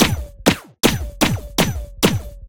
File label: gunshot silenced